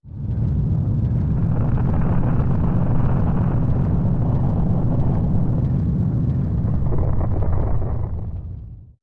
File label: Moon Rumble Stereo; The Moon rumbling (Stereo version)